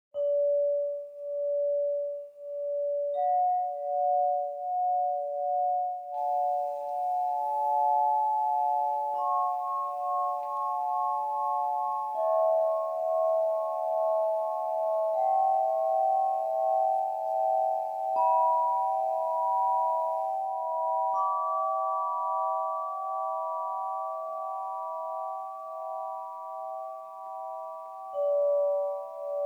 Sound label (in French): Sun D, harpe de cristal sun re 8 tubes